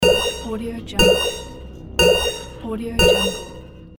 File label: code red alarm; sentrysound